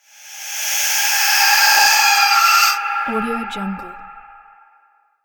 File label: bloody nightmare curses; soundmorph